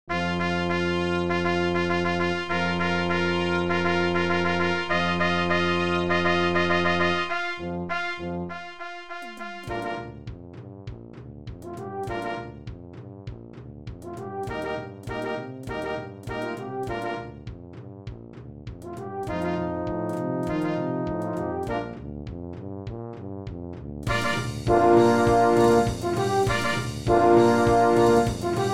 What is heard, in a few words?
Trumpet rock; midi